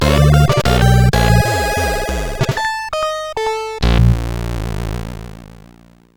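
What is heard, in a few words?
MOS 8580 SID chip music, games; Mazinger Z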